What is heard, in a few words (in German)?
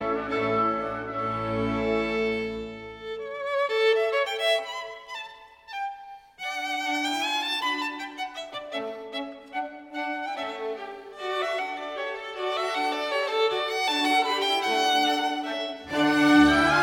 violinkonzert nr 2 d dur kv 211 rondeau allegro